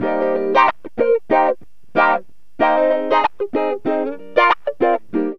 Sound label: GTR, CHANK; Zero-G; Funky Elements